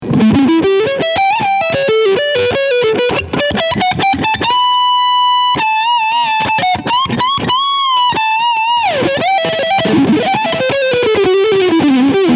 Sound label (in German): PRS SE Mira Overdrive beide PUs HB Modus